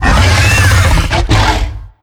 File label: queen jump; tf; slender; attack of the radioactive thing